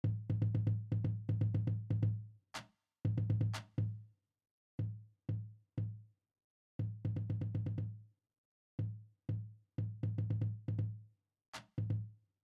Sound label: marching cadence bass drums; warrenton